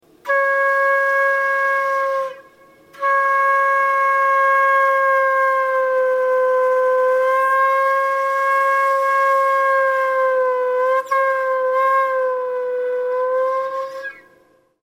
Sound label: detune